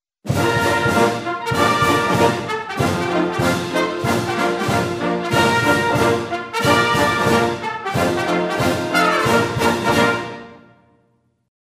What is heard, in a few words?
General Salute, Band; MILITARY BAND; Air Cadets